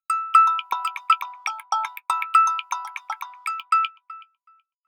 Icecubes, ringtones; Samsung Galaxy M31

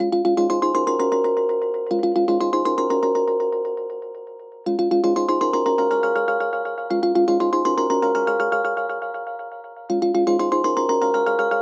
system ringtones abstract

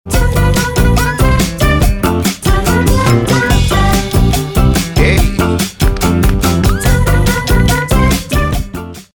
poweron; Telecom Personal

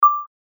Option Click; LG GD900 Crystal; Game Sound

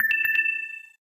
Low Battery

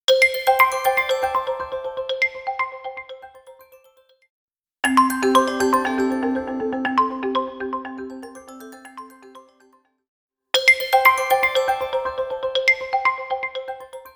Xyl Roll; Vivo X80-Pro

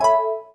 key menu active, Keytone

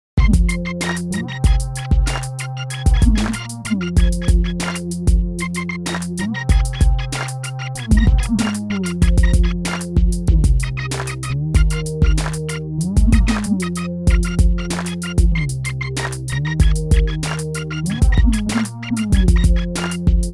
HIPHOP Down, Windows Mobile ringtones

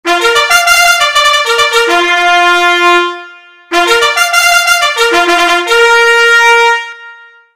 Reveille; SKY IM-U680L

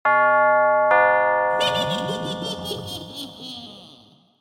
halloween witch; Google; Nest ringtones

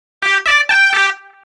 Nasal; PlusFourSix; Sania; Lars Edvall

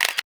Shutter

